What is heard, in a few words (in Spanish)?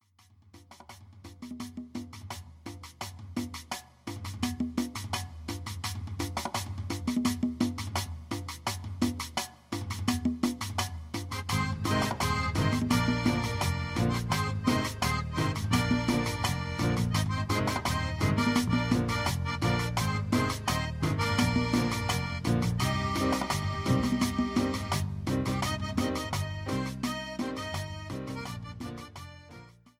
Cumbia